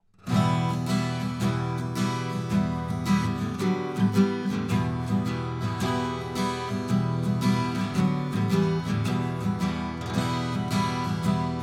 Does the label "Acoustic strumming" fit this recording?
Yes